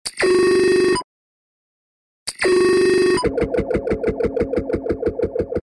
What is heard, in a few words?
Digital audio signal; radio signals